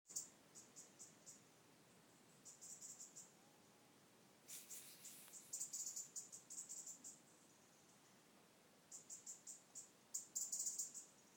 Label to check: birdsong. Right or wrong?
Right